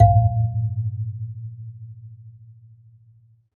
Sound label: AFRBASMP, BASSE AFR; Univers Sons - Raricussions